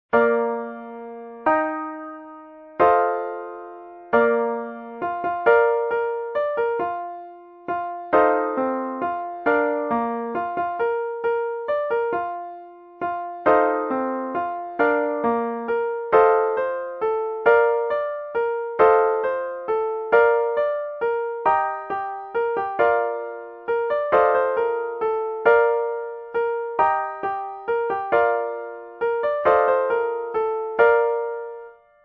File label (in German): die gedanken sind frei synth